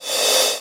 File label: CRASH, Dance Drums; Best Service; Dance Mega Drums